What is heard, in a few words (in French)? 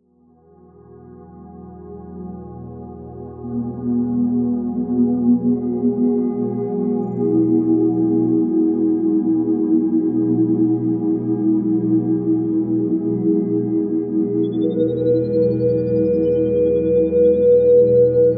Musique ambiance relax